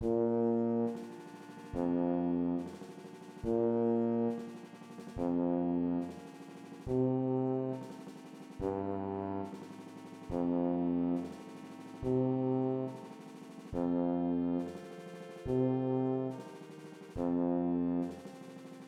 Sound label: tuba